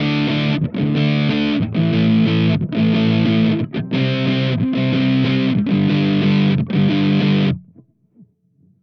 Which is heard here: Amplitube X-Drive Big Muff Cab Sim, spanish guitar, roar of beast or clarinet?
Amplitube X-Drive Big Muff Cab Sim